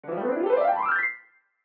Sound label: Piano Gliss Ascend; Musical; Pianos; Blastwave; Sound Effects:Musical